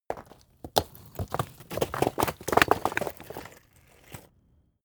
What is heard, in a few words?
stone large fall; natur-tiere; steine